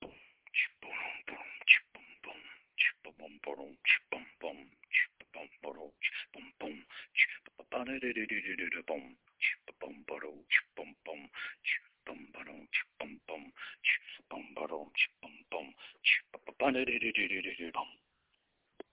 A drum beat

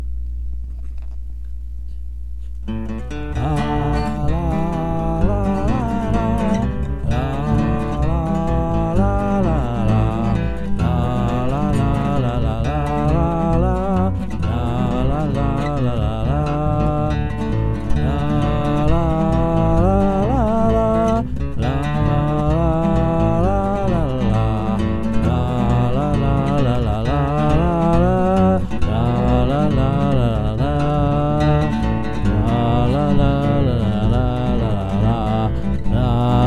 grey day bluegrass

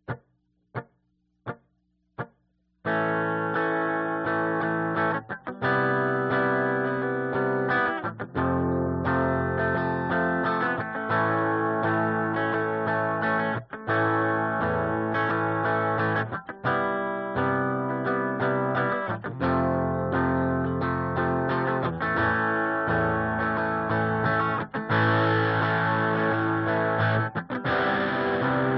some Clean Dirty Guitar Idea

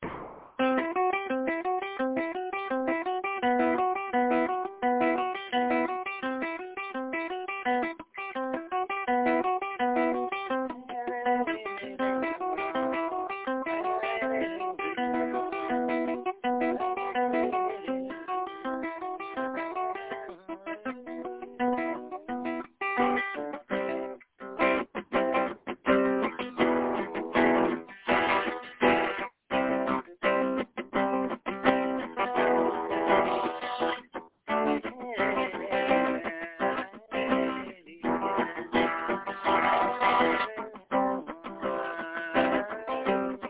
going round in circles fast

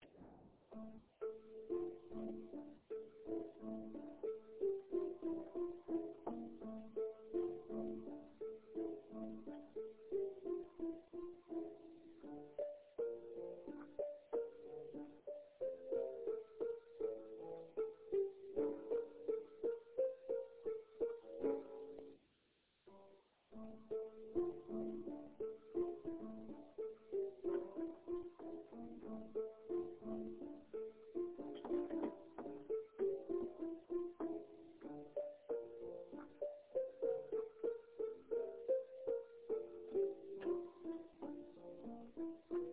Pad

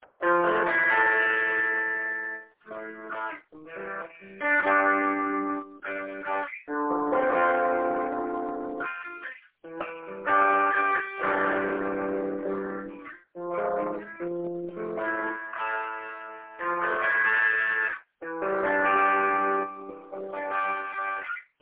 airy chords descending

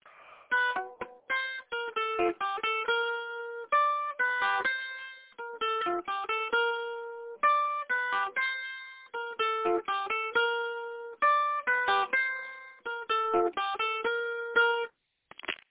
desc west swing melody

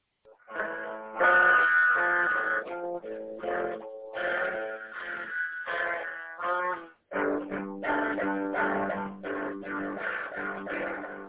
depressed metal

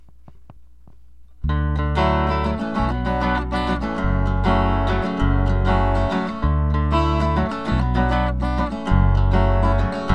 something Minor

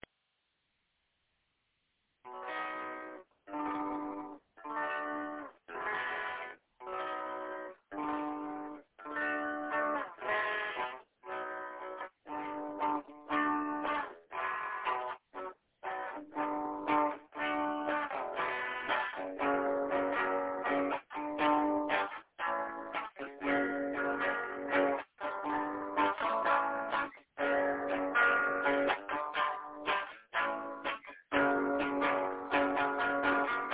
ish chords

